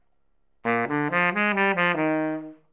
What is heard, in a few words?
TONGUED